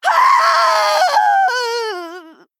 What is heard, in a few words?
Female Troll, Death